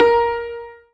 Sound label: zimbal A#3; ZIMBALON